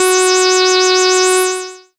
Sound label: synth, f#, Techno Synths; Dance Synths